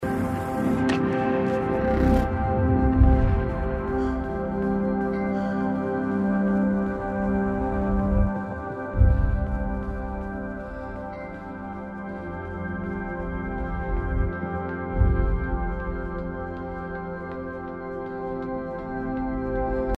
erfolgs meolidie; inside

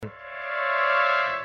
Stealthwarnung; Metro Last Light; gamesoundopedia

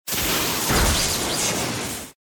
donnerschock; pokemon

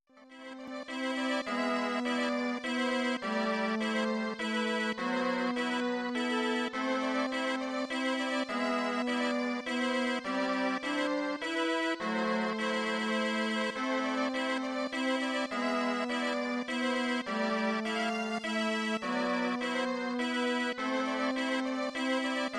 winter; harvest moon

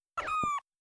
hinunterbohren; jazz jackrabbit 2

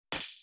axt und hacken erfolglos; harvest moon

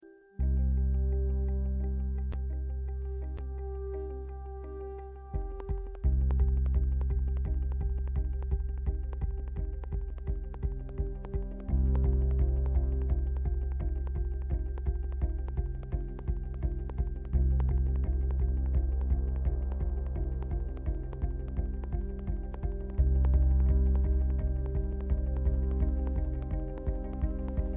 angespannt musik; Life is Strange 2; murrel house; gamesoundopedia

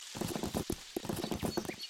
rennen; botanicula

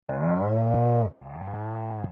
kuh; Stardew Valley

